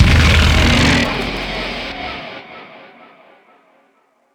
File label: Sting